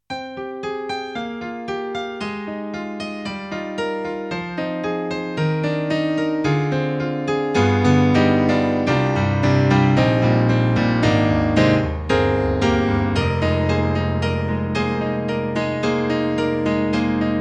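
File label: Korg N1R Stereo Piano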